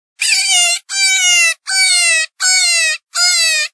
Cotton

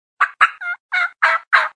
Excited Hen Yelp

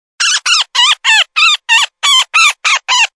Squealing Woodpecker

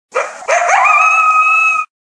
Coyote Challenge Howl

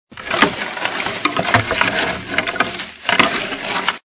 Antler Raking

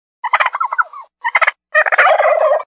Gobbler Fighting

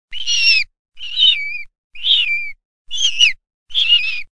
Baby Bird Distress

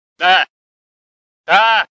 Doe Bleats

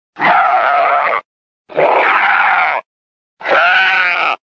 Doe Distress

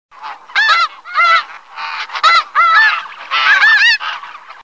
Calling Snow Geese

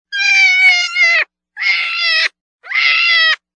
Grown Jackrabbit

